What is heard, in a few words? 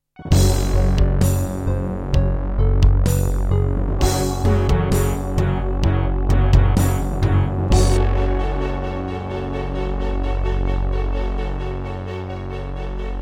Doepfer GMX-1; Doom (Sigil); Introduction